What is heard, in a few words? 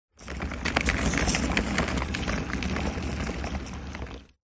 household vacuum cleaner roll on tiled floor while off